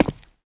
player step; csgom; cstrike

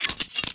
knife stab, butterfly knife; czero; csgo

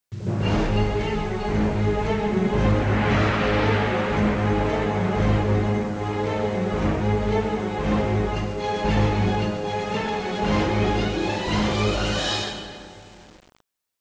round ten sec count; musickits, CT; csgom; cstrike

